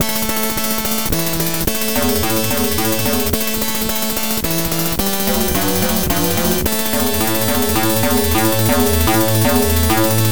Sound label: arcade victory; ikari warriors